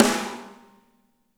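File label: snare right; Spectrasonics; Burning Grooves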